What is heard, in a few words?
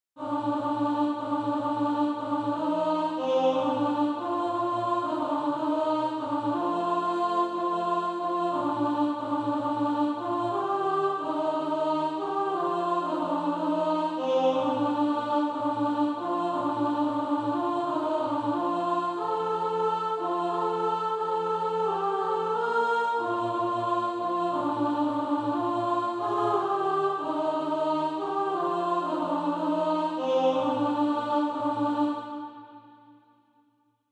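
alt, Practise Music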